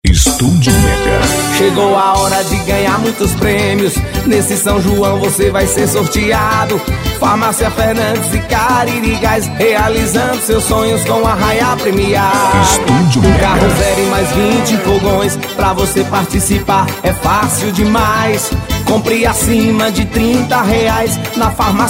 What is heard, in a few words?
JINGLE, São João; PROMOÇÃO ARRAIÁ PREMIADO